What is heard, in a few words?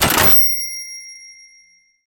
cash register calculation